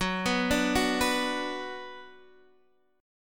Gb Suspended 4th Sharp 5th Gb

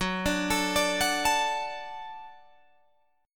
F sharp Minor Sharp 5th F sharp Guitar Open G

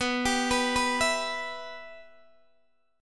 B Suspended 4th Sharp 5th, Guitar, DADGAD

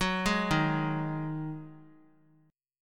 D sharp Minor Double Flat 5th; arpeggiated; chord

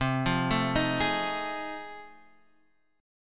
F 7th Sharp 9th C

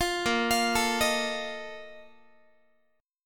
Bb Major 7th Suspended 4th, Guitar; BbM7sus4 arpeggiated

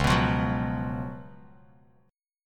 Db 11th Db, Bass Standard; strummed; JGuitar

